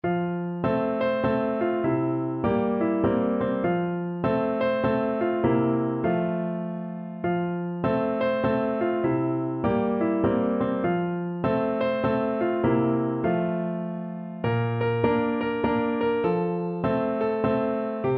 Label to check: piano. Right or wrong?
Right